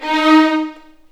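D#4, The Ultimate String Collection, violins marcato; East West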